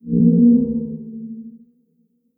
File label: MOLOCH damage